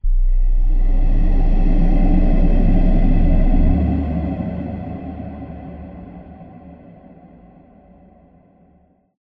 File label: Dramatic Hit Eerie; Five Nights at Ronald's